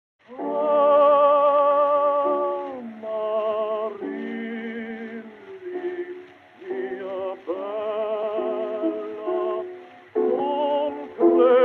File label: Gramophone